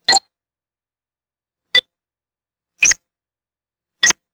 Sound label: synthetic percussive ui button beeps